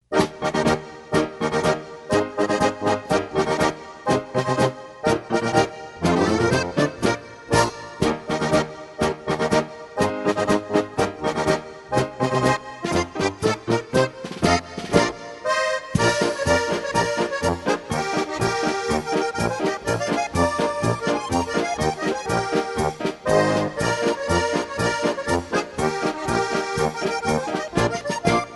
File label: Button Box